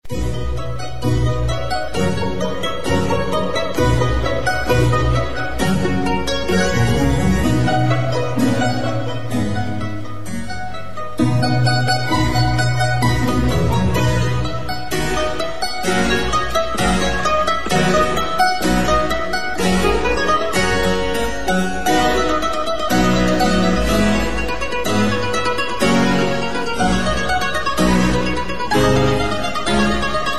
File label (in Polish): Koncert na mandolinę C dur; Vivaldi A.